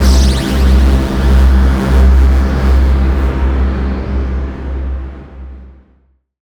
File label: synth texture tonal; BlueZone; Invasion Cinematic Trailer Samples